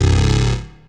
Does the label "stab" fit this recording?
Yes